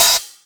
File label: Open HH, Cymbals